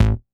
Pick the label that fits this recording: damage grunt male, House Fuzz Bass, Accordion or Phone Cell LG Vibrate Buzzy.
House Fuzz Bass